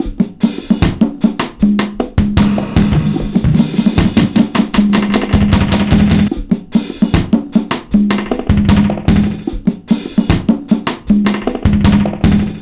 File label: ONE DROP, 76 bpm, Breaks; POTBreaks